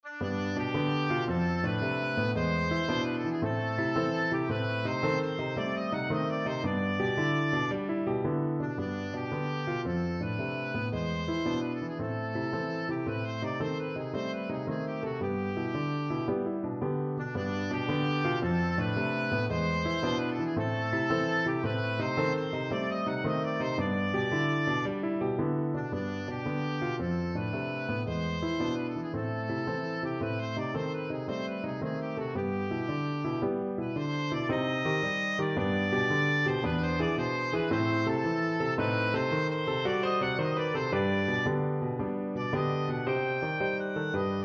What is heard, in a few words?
hora fetelor, oboe